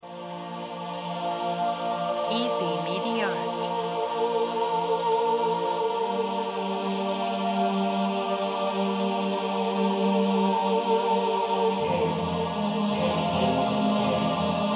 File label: new age clouds and angels